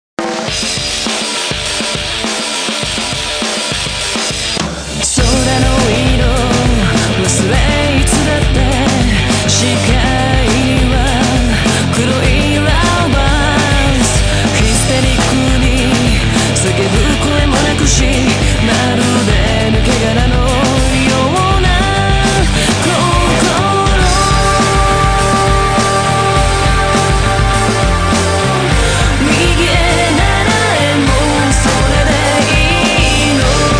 Vocal; Shin Megami Tensei Devil Survivor Overclocked

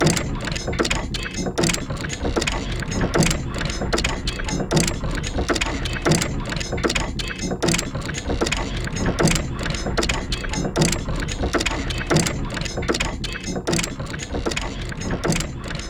Clock Gears

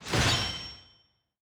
Battle Large Damage

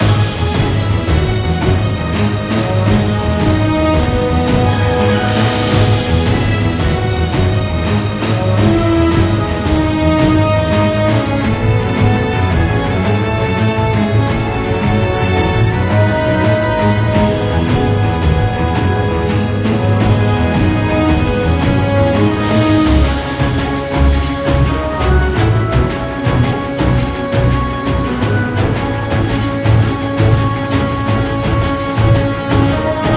God Battle

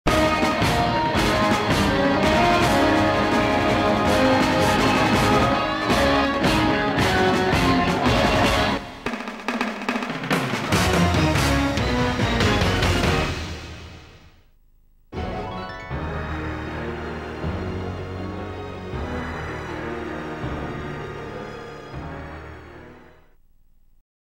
Fanfares; Hydro Thunder; n64

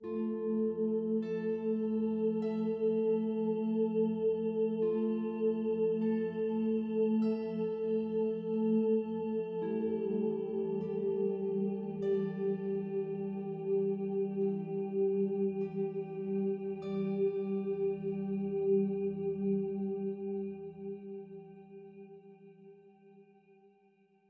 Plaza Loop; solo islands of the heart switch 2019

